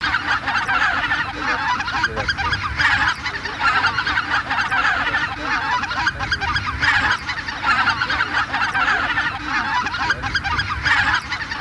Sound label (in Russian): Белолобый базар Oche Lombardelle; plurifon